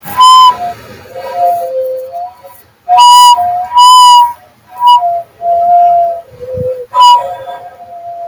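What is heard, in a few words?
creepy creak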